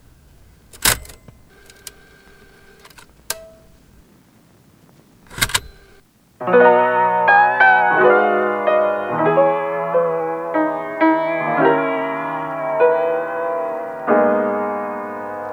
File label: 80s Late Night Bounce